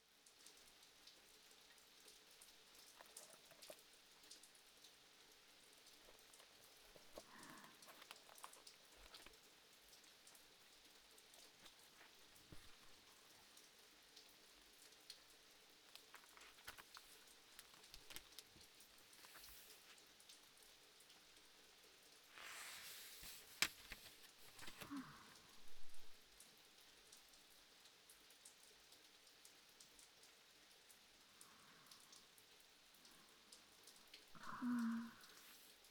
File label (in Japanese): 本を読む＆雨の音